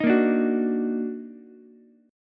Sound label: Eb Minor Major 7th Eb down Ukulele, chord; EbmM7; strummed; JGuitar